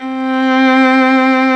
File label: VIOLIN C4 L, SOLO STRINGS; Greytsounds VOL-1